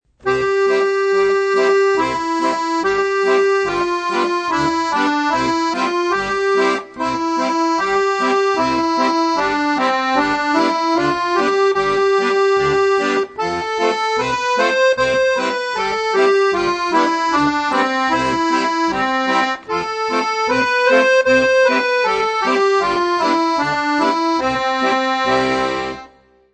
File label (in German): Passend zur Tonleiter, Das Über- und Untersetzen, Akkordeon; Akkordeon Workshop Band 1